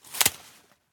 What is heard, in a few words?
Umbrella, Compact, Close, Slide, Fold, Snap; Sergey Eybog; Automatic Umbrellas